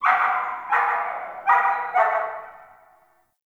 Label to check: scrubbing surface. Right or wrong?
Wrong